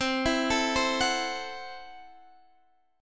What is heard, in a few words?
C 6th Flat 5th, C, down, Guitar, Standard; arpeggiated; JGuitar